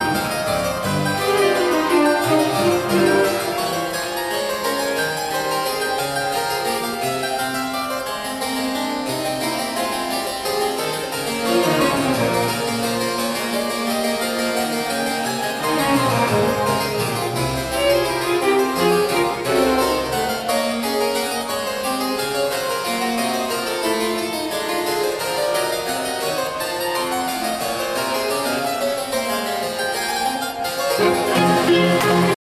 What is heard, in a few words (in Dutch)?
concert voor klavecimbel, Allegro; J.S. Bach; BWV 1053 in E gr.